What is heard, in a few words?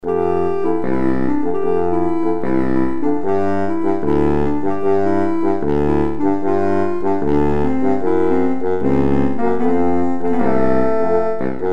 baritone sax